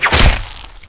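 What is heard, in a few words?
crossbow hit; Hexen II; pest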